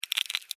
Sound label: Insect Move Click, Insect, Movement, Clicks, Bug; Sound Effects:Animals:Insects; Blastwave